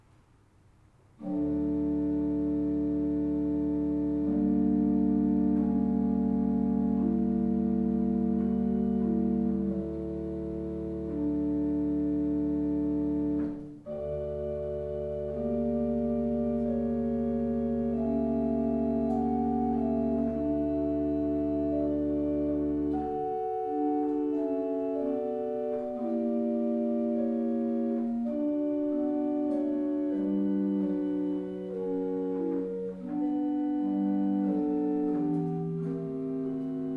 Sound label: Andante sostenuto, orgels; Wilhelminaoord; JE Hauser